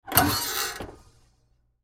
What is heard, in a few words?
Stove Small Drawer Open Antique; Historical; Appliance; Household; Blastwave